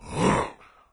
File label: melee; doom3; commando